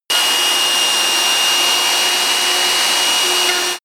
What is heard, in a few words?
sander on load light